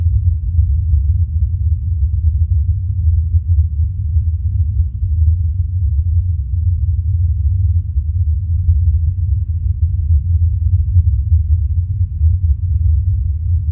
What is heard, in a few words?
Ambience Space